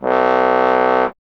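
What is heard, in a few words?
bass trombone; Miroslav Vitous; Solo Instruments VOL-1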